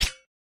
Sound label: Swing Knife; Lethal Company; Windows